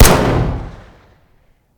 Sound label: fire dist int pistol